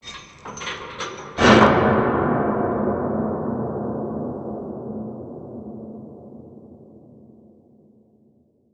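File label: industrial ambience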